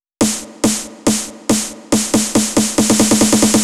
Snares, 140 BPM; Vengeance Samples; Vocal-Hook Kits; Song Kit; Stop!

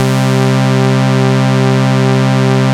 5th Saw C2, Moog Voyager; Moog Voyager Sounds; VES1 5Th Saw

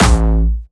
A1, Jumpstyle Kick; Bassdrums; Jumpstyle Multis; vengeance essential club sounds-3; VEC3 Bassdrums

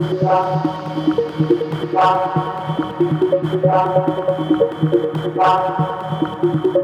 Special Sounds Root D# 140 BPM; vengeance essential club sounds-3

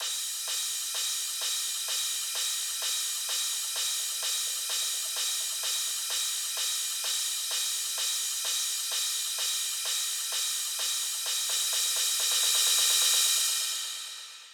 128BPM, House Electro Fills; Beatrocker 2; 8Bars; Vengeance Samples

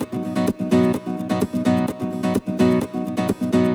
Nylon Guitar B maj, 128BPM; Vengeance Samples; VEH3 Nylon Guitar Kit 2

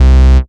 Synth Bass; Vengeance Samples; VEE Synth Shots

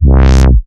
Bass C, Offbeat Bass

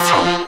Synth Shots; Vengeance Samples

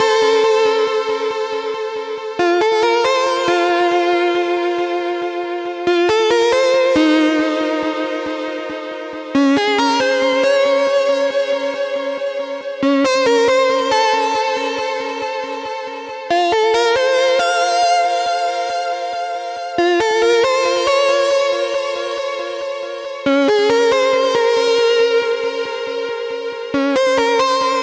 Mainlead WET, 138BPM, F major; Melody & Synth; Vengeance Samples; Another Day Kit

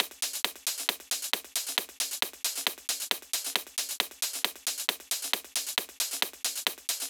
135BPM Loop, Drums & Perc; F#minor

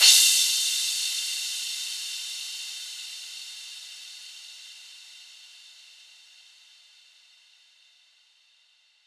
Crash; Vengeance Samples; VES1 Cymbals

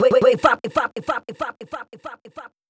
Vocals; Vengeance Samples; Special Sounds

